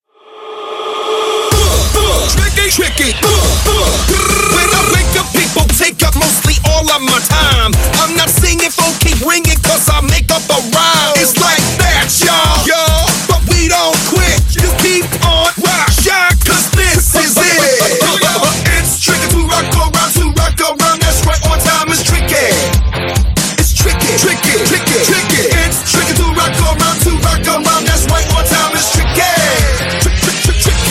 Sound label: Cheer Mix; CCJaycees